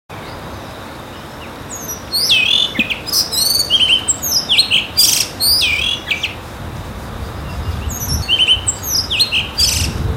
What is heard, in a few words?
isohiyodori, bird; azamidani